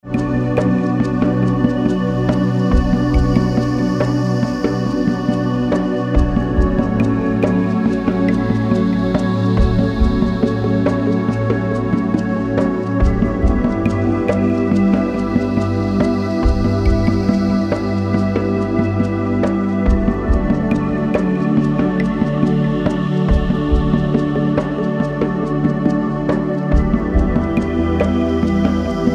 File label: pulse